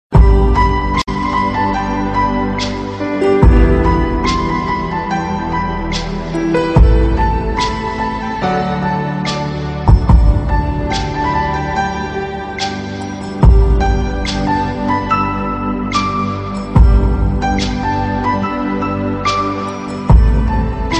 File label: Ringtone Mobile